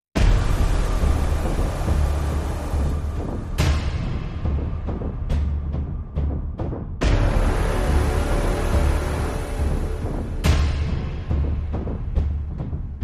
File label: No Low Brass